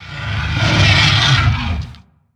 garg pain; slender

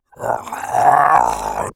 pain; slender; zombiebm

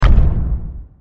step; slender; guardian; foot

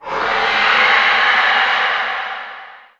screech, horror; slender

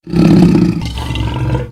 deathclaw idle; slender

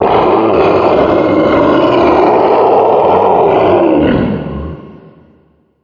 electro die; slender; garg

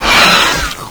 pain; slender

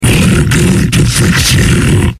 fleshpound alert; slender

